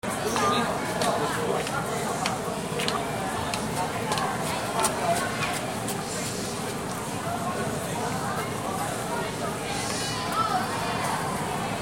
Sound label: Parents waiting area